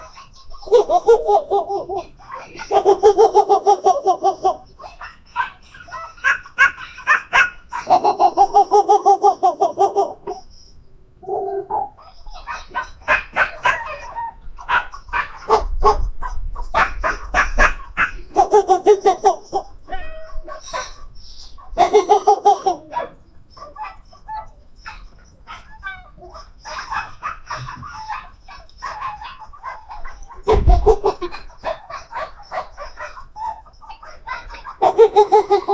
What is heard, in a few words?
laughter of an 18 year ol, backyard